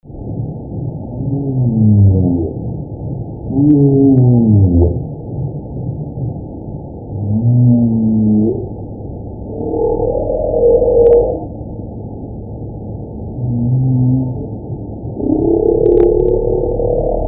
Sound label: Humpback whale song